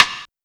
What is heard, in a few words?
Rim; drumkits; Percs; fl studio; young kico drumkit; Chronicles Of The Atlantic Trap (Sound Kit)